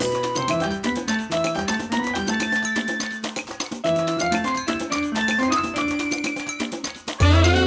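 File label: food world music paradise fight no guitar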